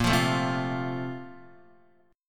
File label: Bb Major 7th Suspended 2nd, Guitar